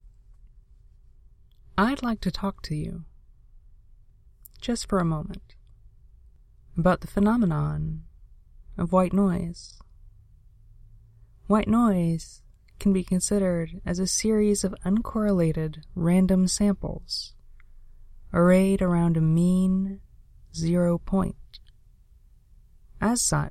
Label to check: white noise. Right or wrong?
Right